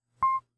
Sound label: vox disable; Instant Messaging; TeamTalk V 5.5 Portable